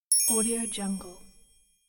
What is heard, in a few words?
christmas positive notification